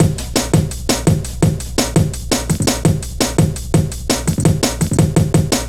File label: Step Ez, Drum Loops, Jungle, 168.5